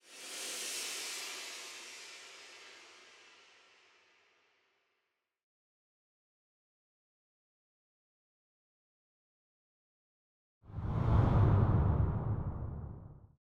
SFX; Bad Dad Rehab; Bad Dad Rehab trailer

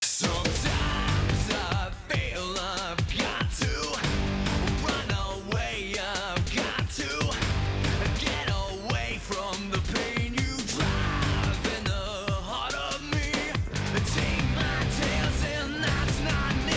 Music Stereo; Clean Cuts

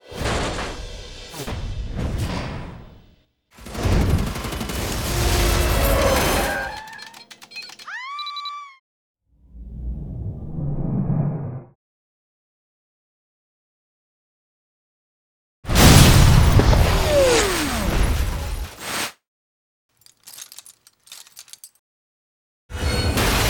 SFX; Star Studded Saturdays; Swordfish

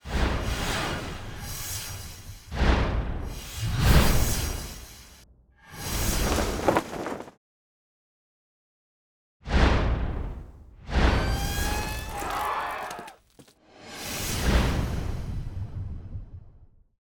SFX; NewsOneNow; Moving

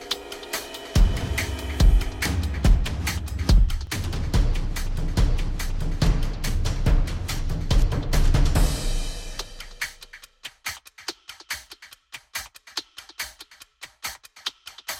Music; Next 15 season 1; Next 15 clip spot; Launch

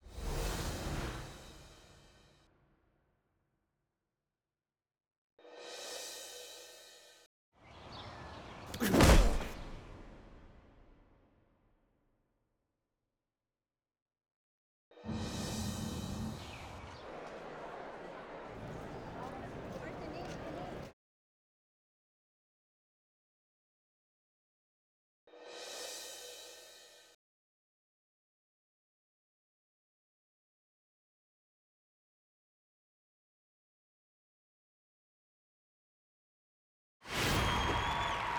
SFX; Easter Movie Combo

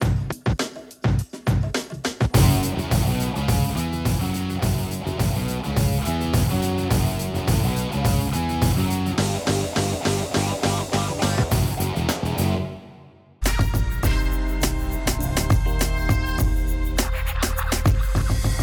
Music; Everybody Hates Chris; Launch

